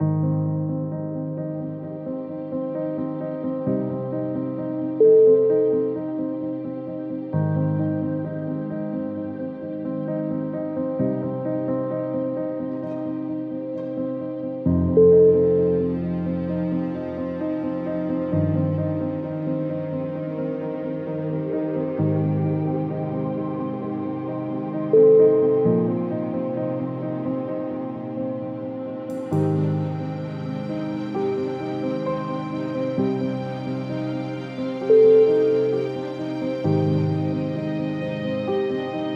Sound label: historical drama